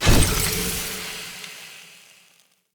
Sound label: Pipe Damaged; Encounters; Ode Pollution Tower